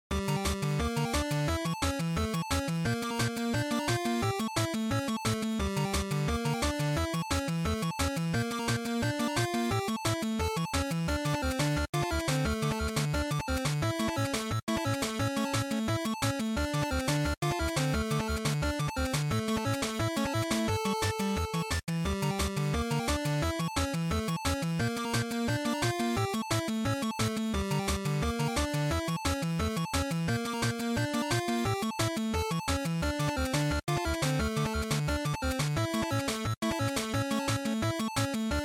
GB Action Boss

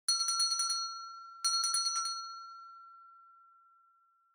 Winning Bell Gentle

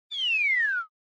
Motion Falling Whistle High

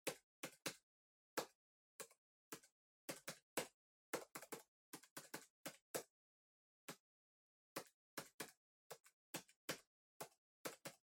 PC Keyboard Far Arrow

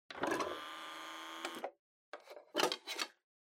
Portable Stereo CD Open Take Out

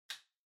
Light Switch American Toggle Far Dry Mid

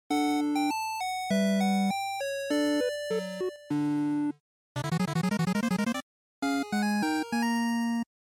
NES RPG

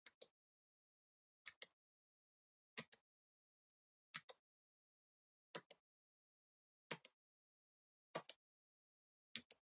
PC Keyboard Far Single Gentle

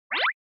Motion Slide Fast

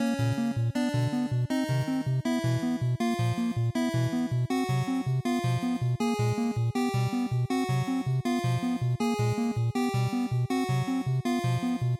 NES RPG Escape Loop160

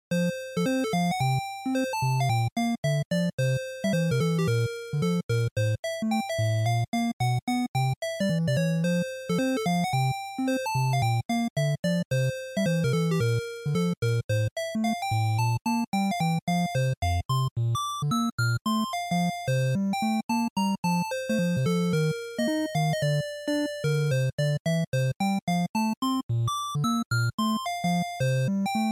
NES General Stage

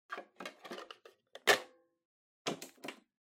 Portable Stereo CD Put In Close

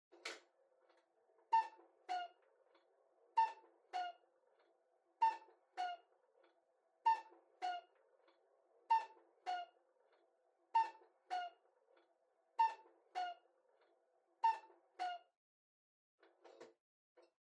Cuckoo Clock Far Long

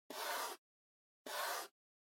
Trackpad Swipe

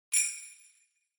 Sleigh Bells Dry Knock Hard

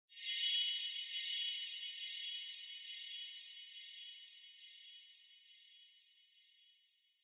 Onoma Sparkle Long

